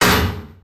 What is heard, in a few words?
hook impact, weapons